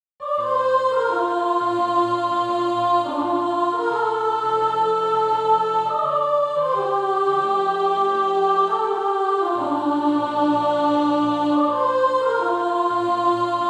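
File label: soprano; Calvary Darwood Sheet Music (SATB)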